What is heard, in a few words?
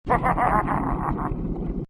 elite laugh